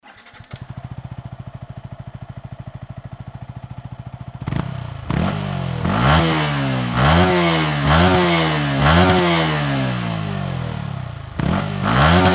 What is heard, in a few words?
xsr155 normal; rg63